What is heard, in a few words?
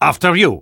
battlecry